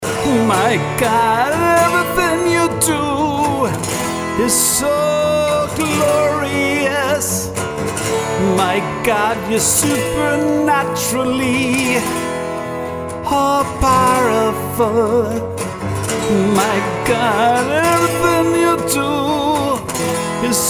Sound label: acoustic